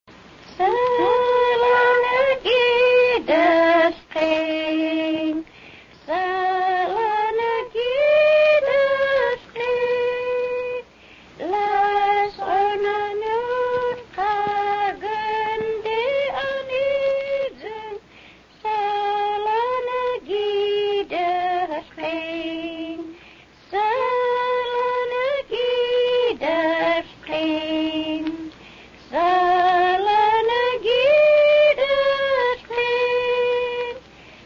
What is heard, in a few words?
gospel song